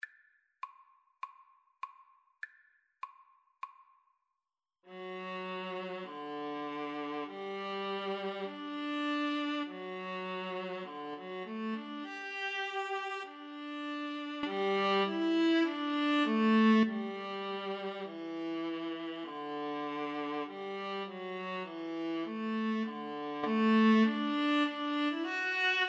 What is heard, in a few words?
Viola Duet